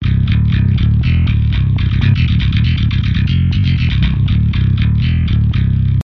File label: Bass; Bass-trilianの圧縮